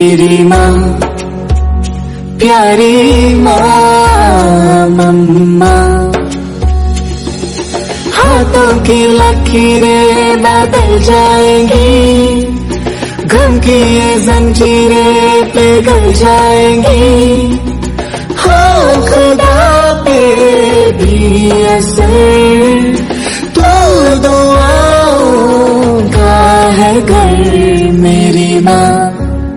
Birthday Song For Mom Dj Remix

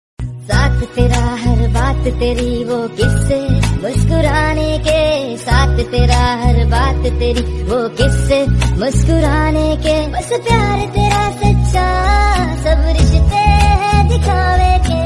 Brother Birthday Song Hindi

